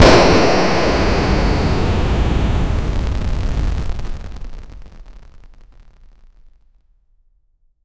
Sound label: a tnt explosion sound